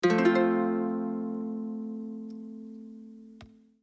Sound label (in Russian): akkord gavaiskoi gitary